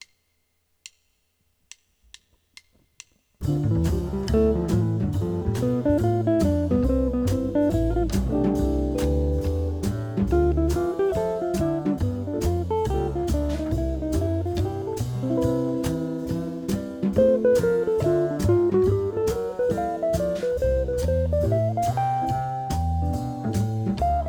II V I C Guitar

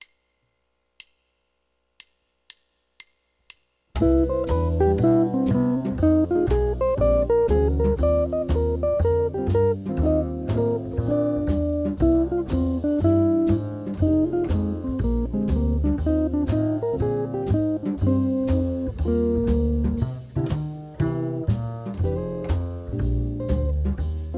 Cmaj Ebmaj

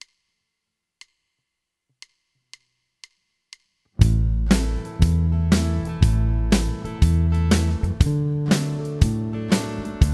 Country Solo Backing Track

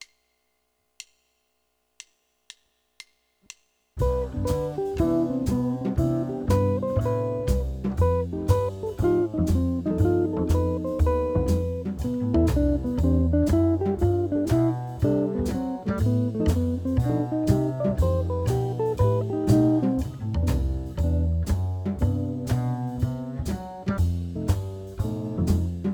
Cmaj7 F7; Cmaj7 F711

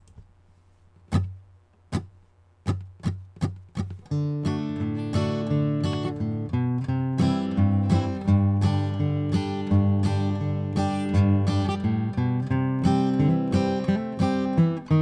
Blue Grass Solo Backing Track

